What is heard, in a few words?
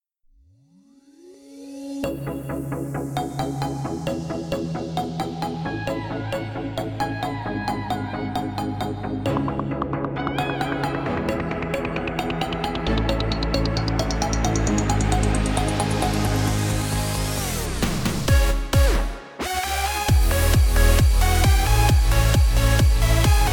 Instrumental